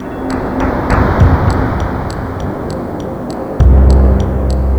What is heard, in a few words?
big clock